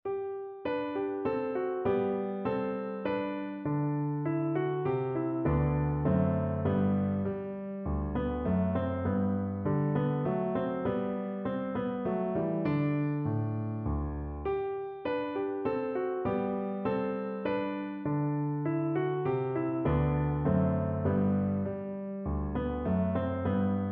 Minuet, piano

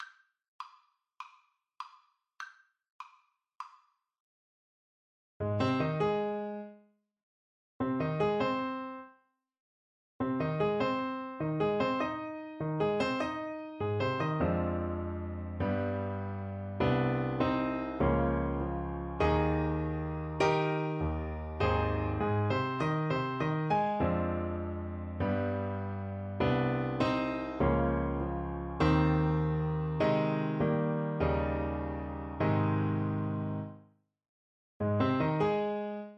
Tenor Saxophone

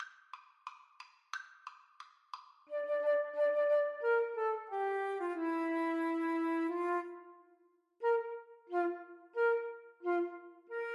Flute duet

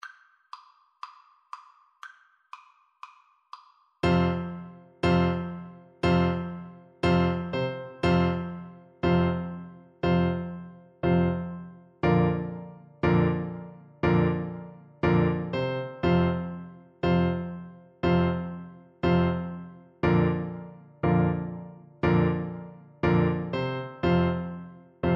tchaikovsky 1812, Bassoon

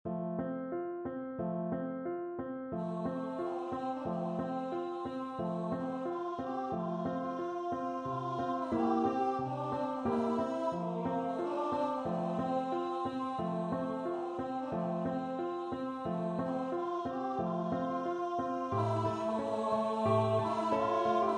aria, tenor voice

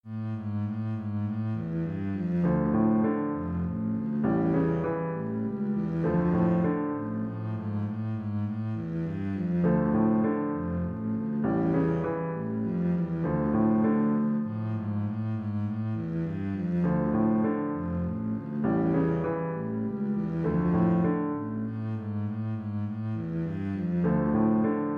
beethoven fur elise, Double Bass

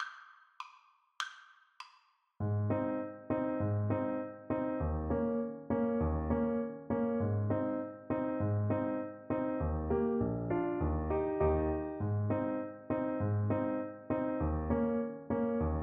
clarinet

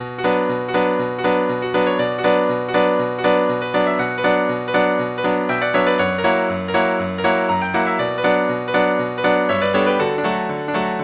Ungarische, piano

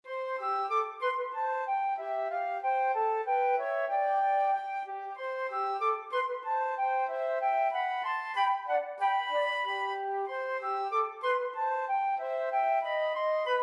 flute duet

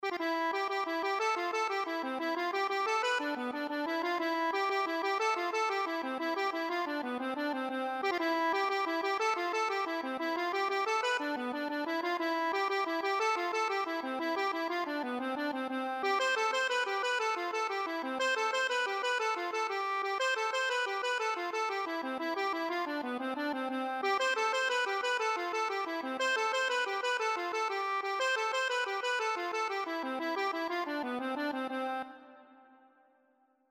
move to me, accordion

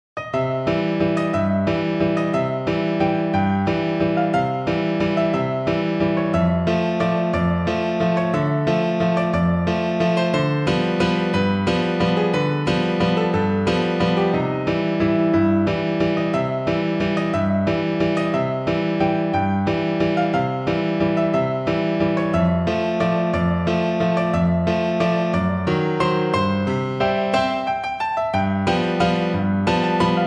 strauss vienna piano

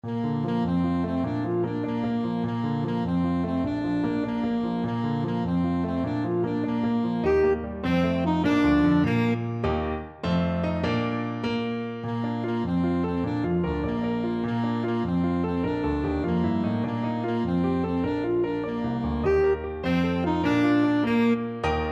pop goes, saxophone

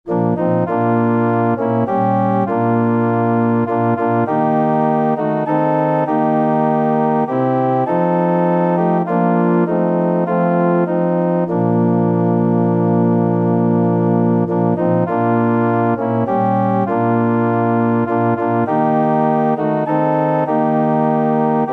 organ

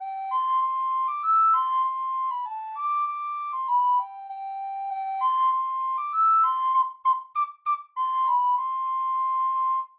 recorder

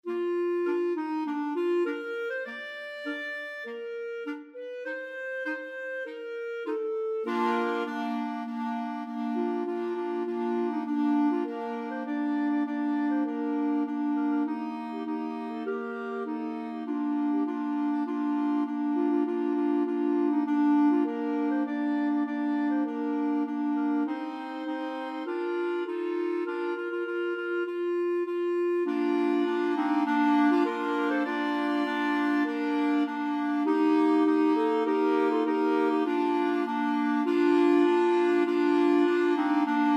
clarinet quartet

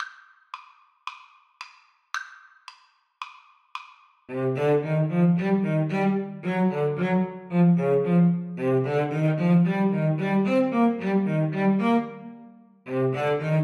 mountain king, Cello Duet; greig

